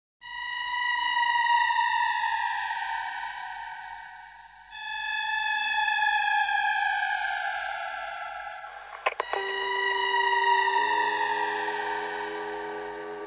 soundscape mix

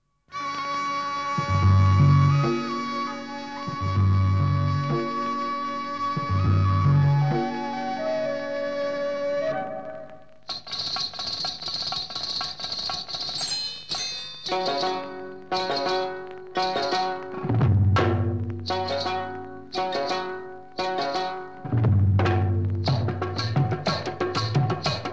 instrumental

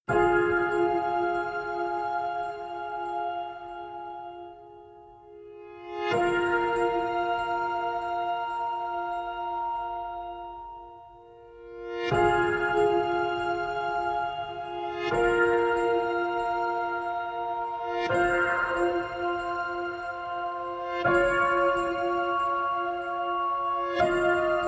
piano only

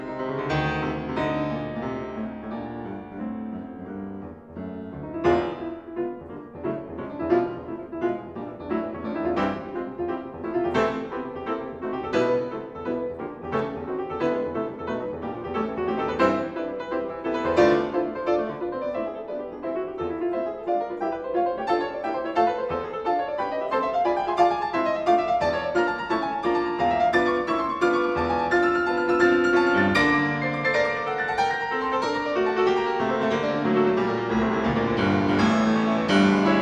piano sonata no 1 in d moll piu vivo; sergei rahmaninov; op 28; movement 3

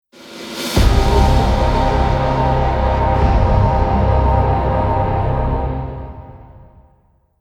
game over

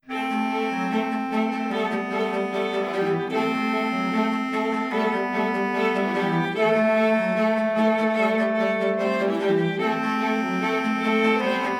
bass clarinet and string quartet

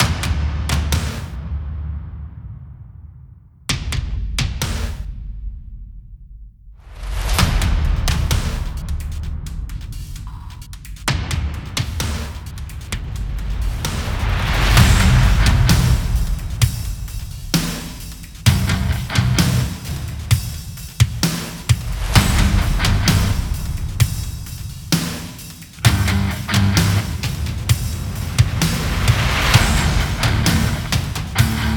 band only

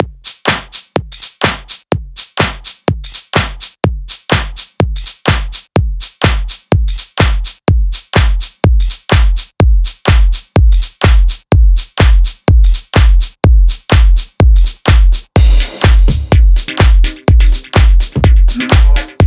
instrumental mix

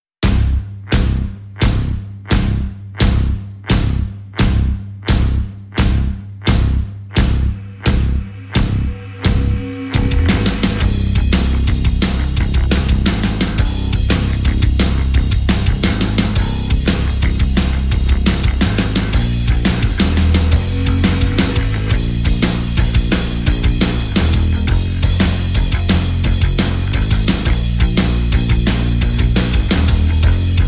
no guitars

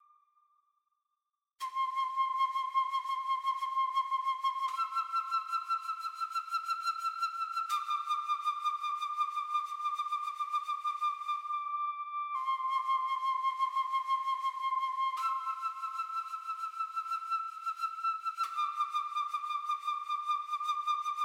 native american flute

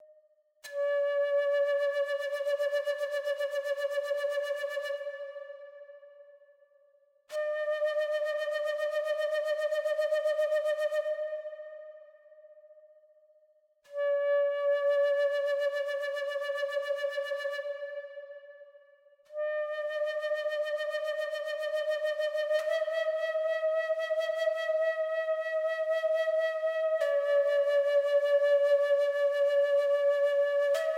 native american flute

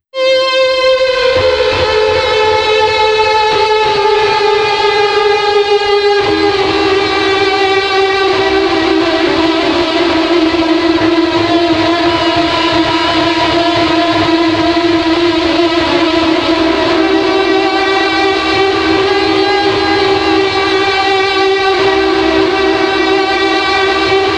guitar dj tool element

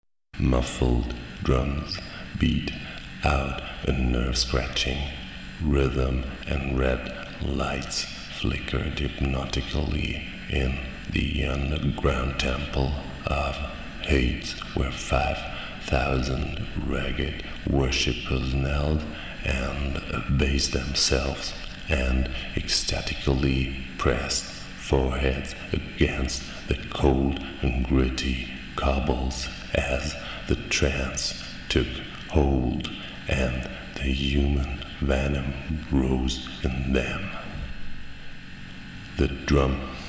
muffeled drums

